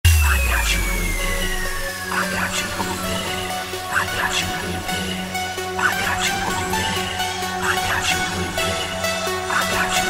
club remix edm

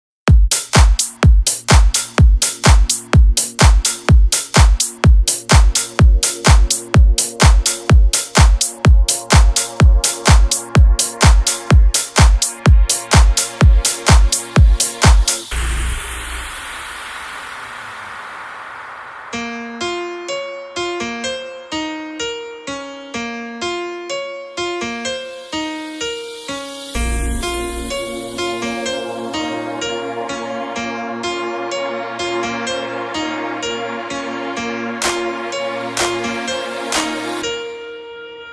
remix drums intro

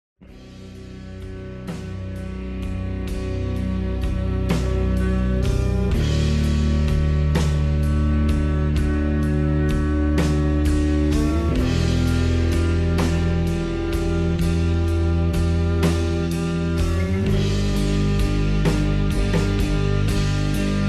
slowed reverb

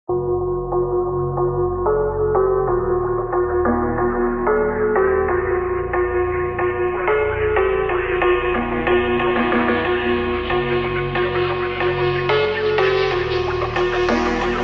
phonk slowed reverb

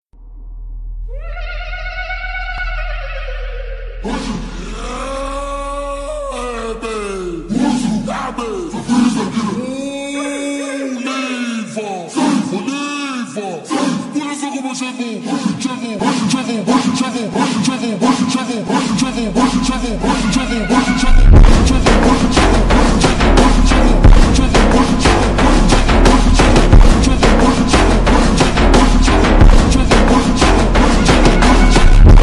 ultra slowed and reverb

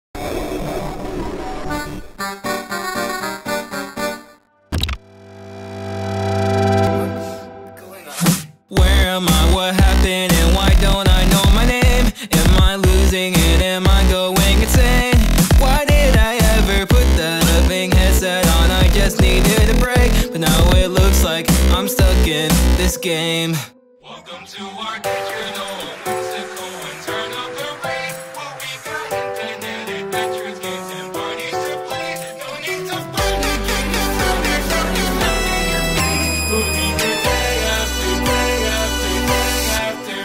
remix; the amazing digital circus animation

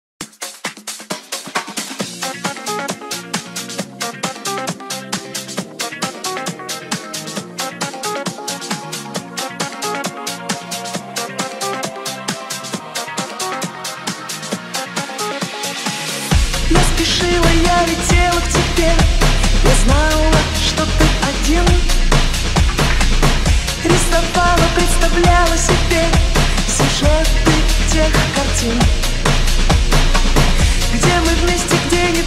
slowed hardstyle vershion

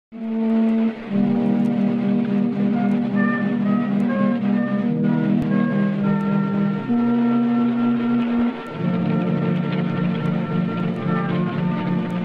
instrumental

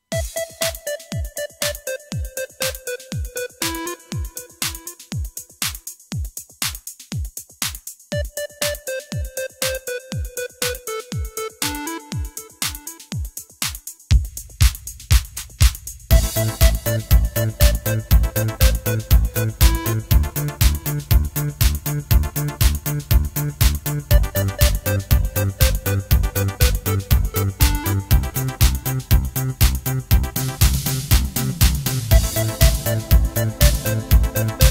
korg pa900; НОСТАЛЬГИЯ ПО 80-ЫМ; Igor Korg

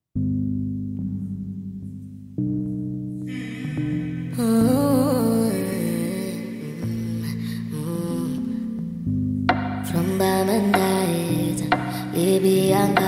sped up reverb

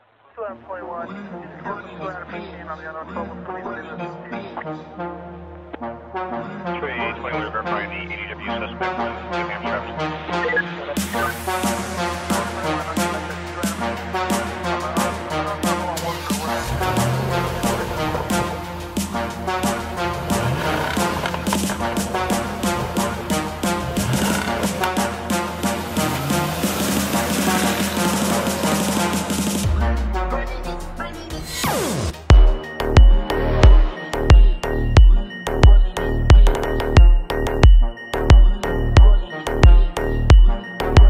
deep house beat car music, усиление басов; beatzz

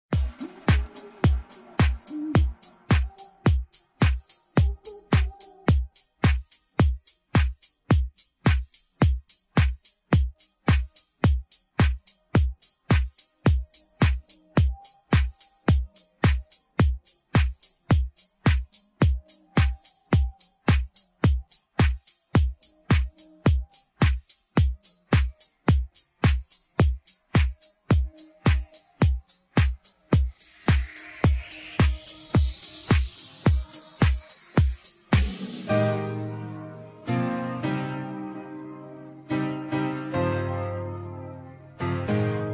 instrumental slowed

